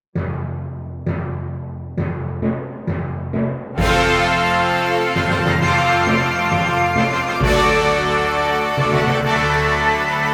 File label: New Note A Horns in Fifths